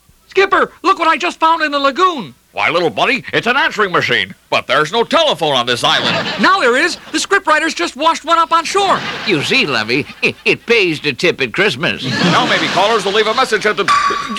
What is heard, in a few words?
sound of beep; Celebrity Answeralls; Answering Machine